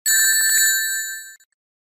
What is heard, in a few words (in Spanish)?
Sonic efecto de perder anillos; Pantalla Verde